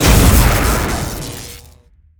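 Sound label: Explosion Medium Electricity; Destruction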